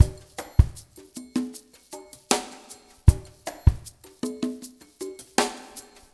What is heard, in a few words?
ICE BRUSH WETMIX, Liquid Grooves; Spectrasonics; Liquid Grooves 1 & 2